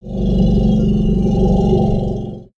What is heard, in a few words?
Pet Elephant Growl; creatures pets